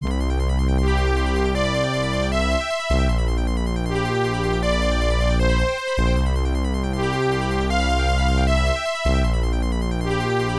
Unused BGM

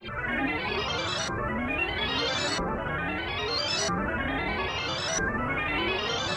Flesh Tunnels C - Ambient

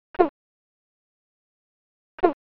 Damage (Ugh!); Oretachi Game Center Zoku: Yie Ar Kung-Fu

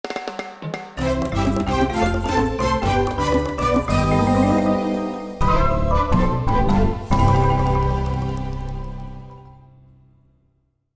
Music Jingle Ending Good

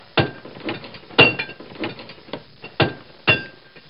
SFX CHAIN

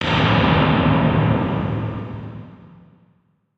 Boss Fight Opening; windscape switch windows xbox one gamerip 2016

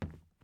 plastic step; teardown ps5 windows xbox series xs gamerip 2022

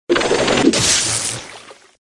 Sfx Lancer Passive

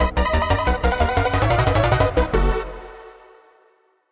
level start; bomberman 1 2 arranged 2022

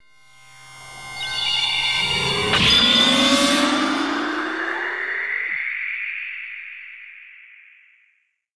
Lobby Menu; Dragon Ball Xenoverse Deluxe Edition; PS3; Xbox 360

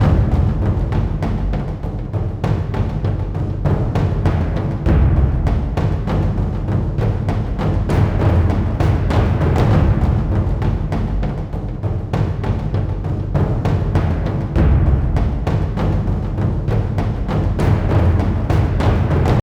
Combat Big Enemy; Dungeon Hunter; android game music

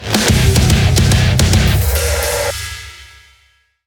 transition; Rip & Tear transition09

